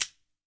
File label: card put